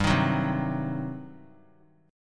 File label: G Major 7th Suspended 4th Sharp 5th, G, down; GM7sus4#5 strummed